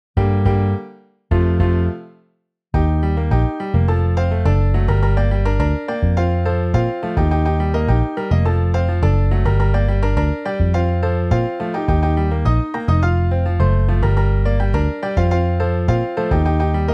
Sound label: Piano Bass; IRE PRODUCTIONS